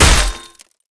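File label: damage mace plate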